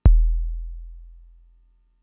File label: kick, Fricke MFB-522